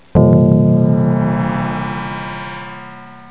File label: gong; Isaac Asimov's The Ultimate Robot (1994)(Microsoft Home)